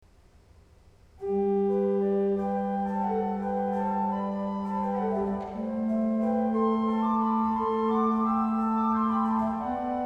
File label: Organ Canon; Shepherds Canon